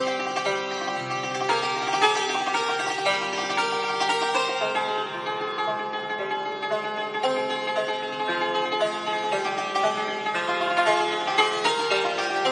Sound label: Tak Navazi Santoor